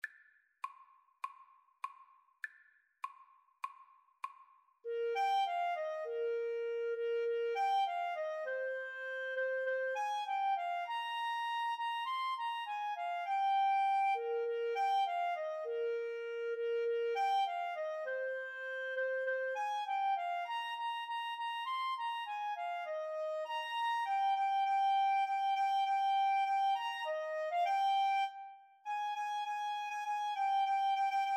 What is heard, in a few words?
jingle bells, Clarinet Duet